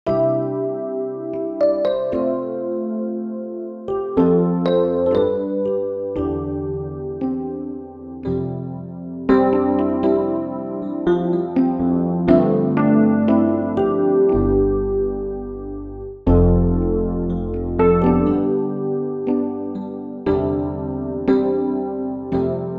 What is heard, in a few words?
keys sweet 80s ballad